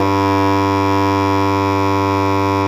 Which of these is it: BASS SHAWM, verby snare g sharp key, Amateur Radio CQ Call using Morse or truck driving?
BASS SHAWM